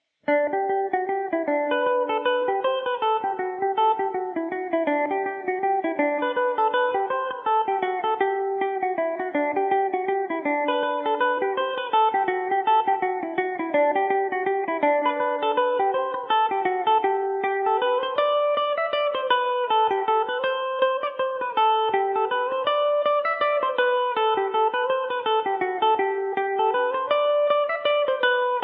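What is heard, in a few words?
mazurka G major revelation, irish mandolin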